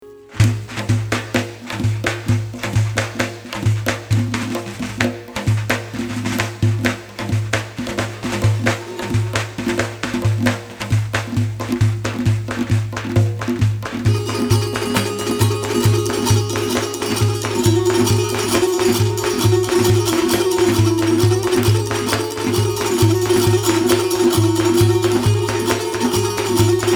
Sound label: Folk Music